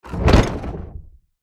Platform wooden game menu ui clear

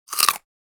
Bite crisp crunch single

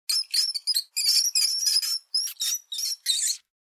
Mice chasing and loud squeaks

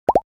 Cartoon bubble popping

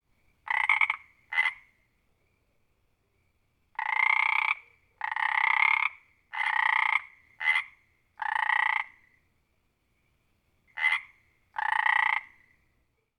Single frog croaking close perspective

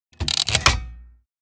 Casino game mechanical lever pull

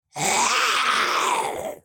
Demon beast imp monster pain damage hurt

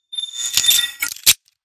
Futuristic gun firing mode switch

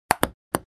Table tennis serve

